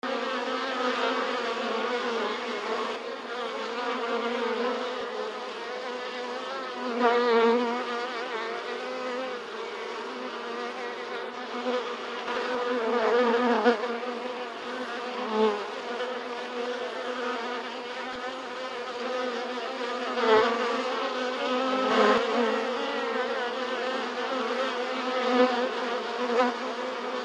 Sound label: atmo insects lots of bees